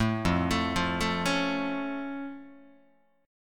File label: F Augmented F Guitar; arpeggiated